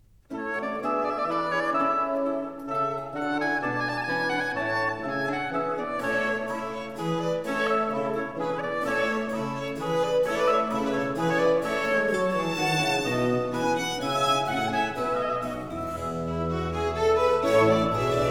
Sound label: Concerto Grosso in B flat major, Minuet; Georg Friedrich Händel; English Baroque Soloists, Sir John Eliot Gardiner; Concerti Grossi, Op. 3